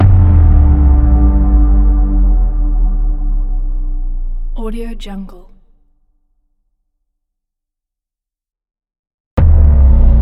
dark side impact

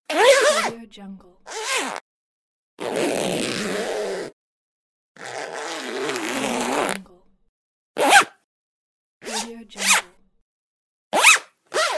zipper

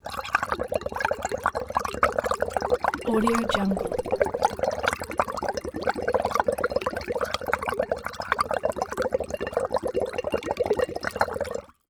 bubbles small though straw

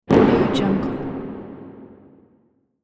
atmospheric impact; film and game

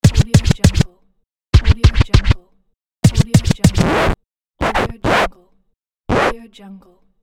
dj scratch

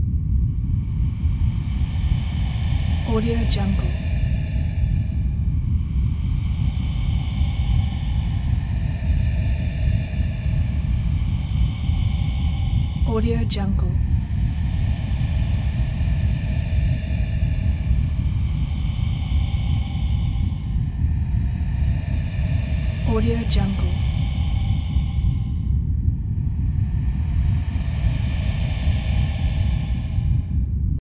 breathing presence constant airy breaths; prosoundfx

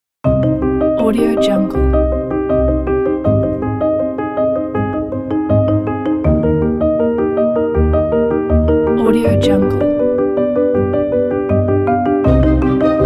comedy fun

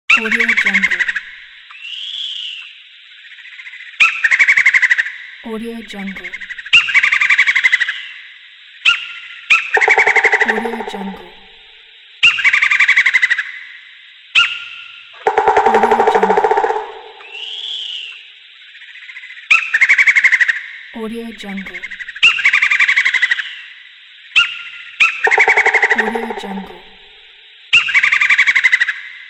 forest sounds

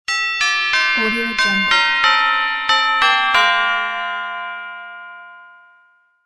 ring doorbell chime sound

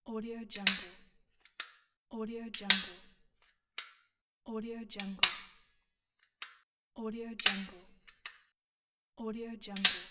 stapler

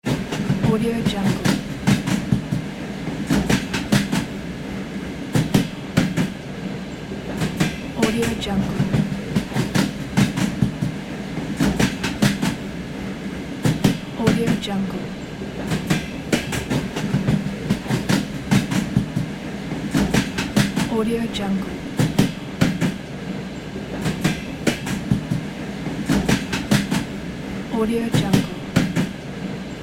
train

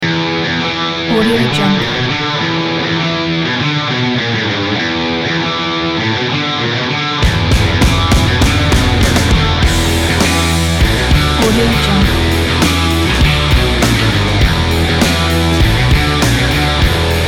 old school rock

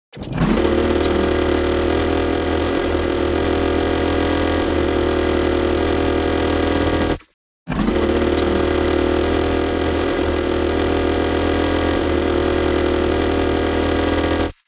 sentry gun

